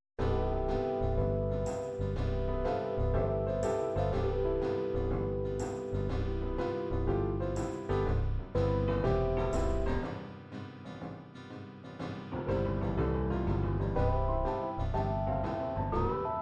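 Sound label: Country shuffle, midi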